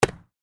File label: football catching